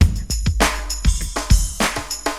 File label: Drums, Acid Jazz Funk; LiveAct Pool